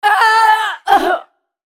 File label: dying; Zyra; en US